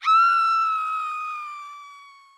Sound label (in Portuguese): grito feminino